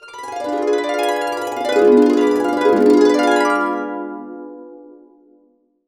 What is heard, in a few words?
D DIM7 UP DN, Harps